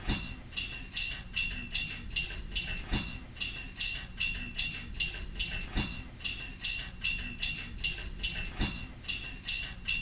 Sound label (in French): chaine tempo